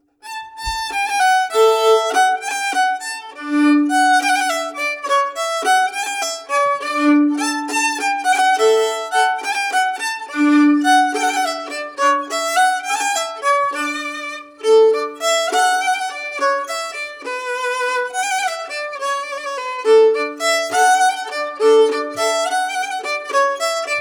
Valse

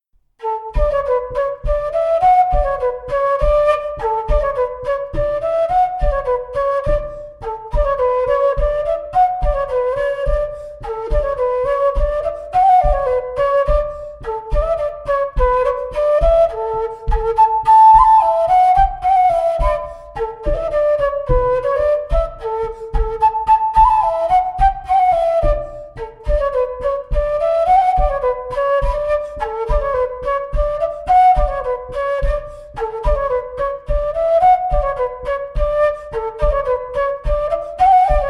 Montagnarde; Recueil De Raoulx

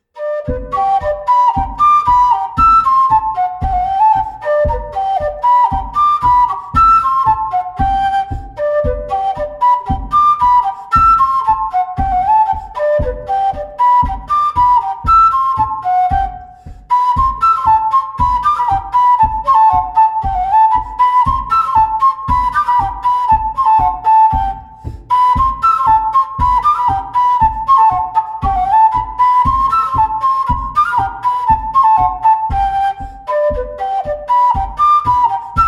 Bourree; Recueil Laussedat

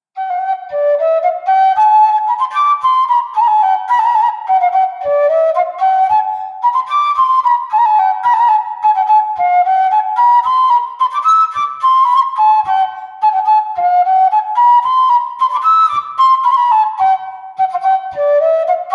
Dance d Auvergne; Chédeville; Les Dances amuzantes 1733

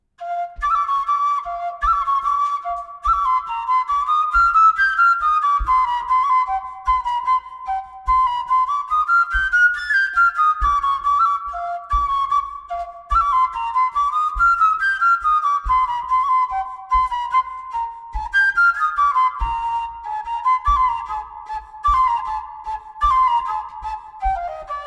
Bourree transition

